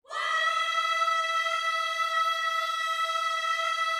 WHOA E 5, Vocal, Gospel; ILIO

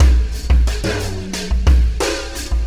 Alien Influence Beat, Processed Drumkits; Sonic Foundry (Sony Creative Software) - Processed Drumkits Zero Gravity Beats

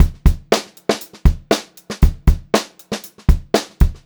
POP BEAT, Pop-Rock Drumloops; 118 POP

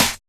SNR, HIP-HOP; N-SHOT; Drums In Your FACE!

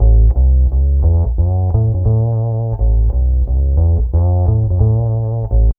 BASS, Funky Ass Loops

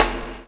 CHAIN; Spectrasonics - Backbeat

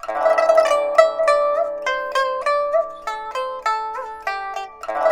PIPA, 90; INIS - Opium; PIPA 100-C

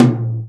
tom, Real Drum Kit, jungle kit

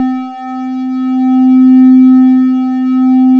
C3 PRO5 LEAD, SQUARE LEAD; AKAI S-Series CD-ROM Sound Library VOL-7

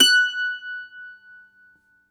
MANDOLIN, Plucked Strings

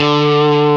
GTR Tube, Dirty; Club-50 - Foundations Roland

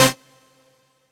Dance Mega Synth; Best Service; 90 s Sample CDs

